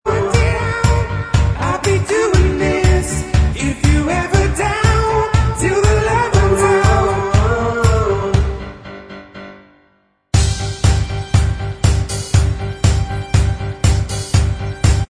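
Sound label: backing vocals, backing track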